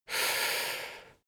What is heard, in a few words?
male breath medium in